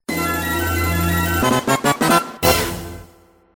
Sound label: oh well music